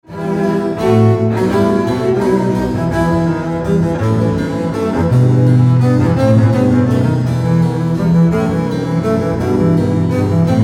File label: Triosonate; GF Haendel